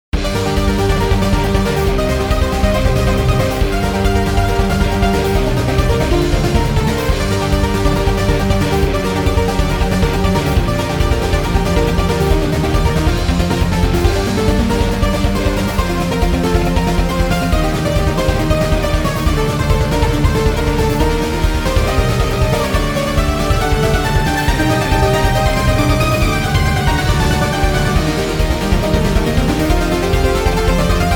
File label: dungeon Battle